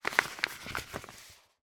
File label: foley poster map roll up; zapsplat